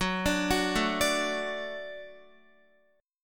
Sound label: Gb Minor Sharp 5th Gb Guitar; arpeggiated; JGuitar